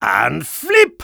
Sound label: Spy taunt flip exert; «Иии кувырок!»; Насмешки шпиона